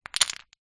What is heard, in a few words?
leisure puzzle pieces few down on ground; jenga; zapsplat